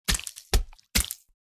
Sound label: Hitting bloody body